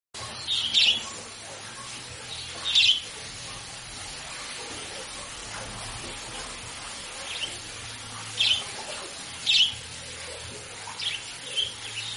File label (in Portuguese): canto do periquito australiano branco